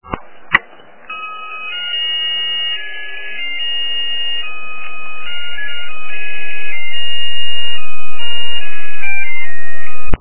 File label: lighter; chairman mao